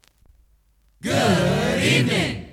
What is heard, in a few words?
jinglepackage; topformat LP jinglepackage 2